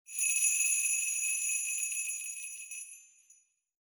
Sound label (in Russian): korotko bubenchiki